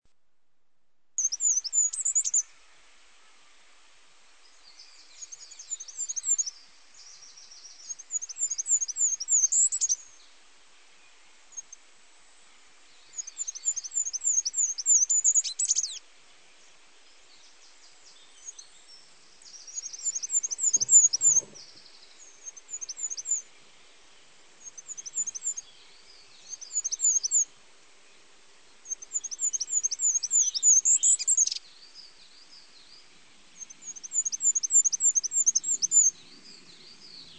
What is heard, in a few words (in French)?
Roitelet huppe, chant